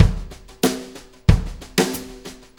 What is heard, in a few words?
Brushes 16ths Snare, Snare Loops; Sonic Foundry; Sony Creative Software; Loops & Samples Drum Tools